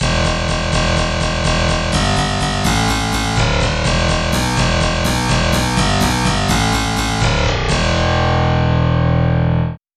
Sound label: harpsichord bass; SpectMorph Evaluation #4